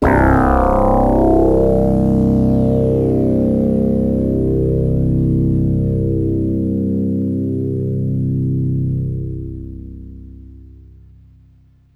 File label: BASS Metalic Moog Modular; Boss